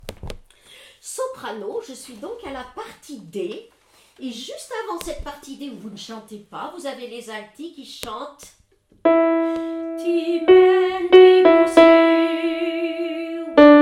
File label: Magnificat Soprano; Pachelbel